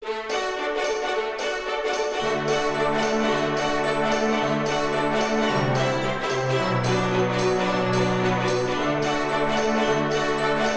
Instrumental Orchestral Remix

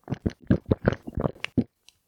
pump; Lewd SFX

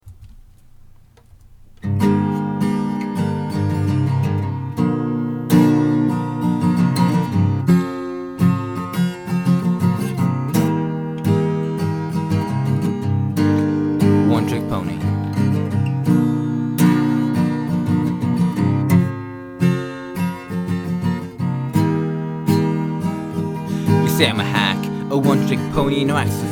(Acoustic Cover)

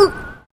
Grunt; Lewd SFX

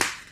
Sex Smack

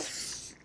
Suck; Lewd SFX

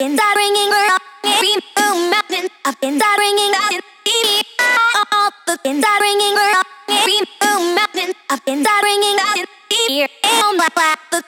Acapella 170BPM

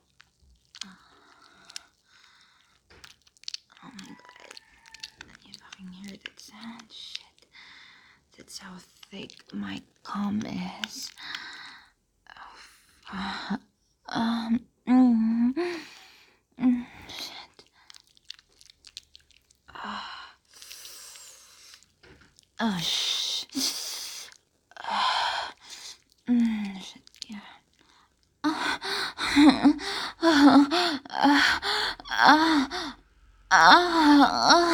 Moan Practice

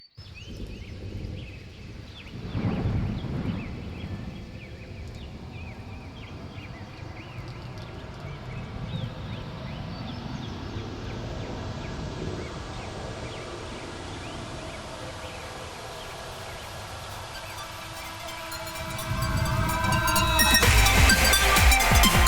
Rainwater Beat

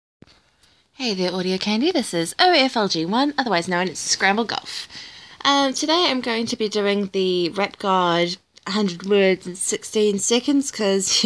F, Rap, 100 Words in 16 Seconds

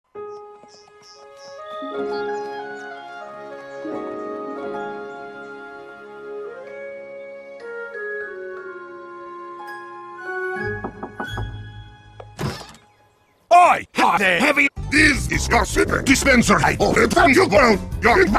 Singing Dispenser

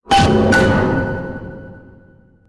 Upgrade Unlocked Sound; Lewd SFX

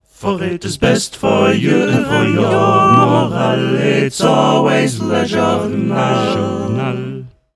jingle

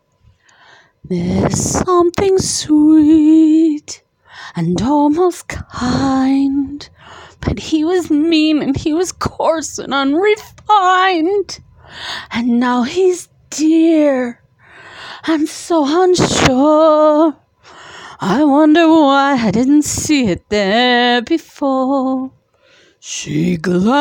Solo singer, F singer, karaoke; karaoke challenge

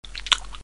Suck; Lewd SFX

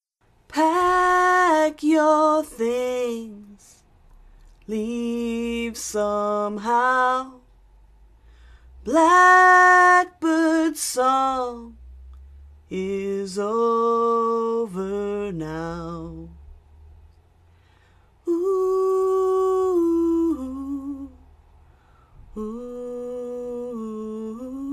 Sings Her Version Of Song Cover

